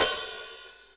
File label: long crash cymbal one shot d sharp key; drum sample